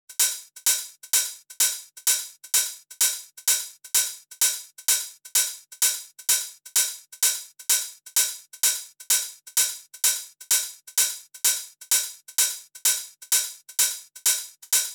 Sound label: Top Loop - 128 BPM, house top drum loops; Cymatics